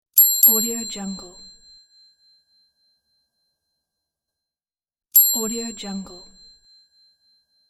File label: ding; tr-sound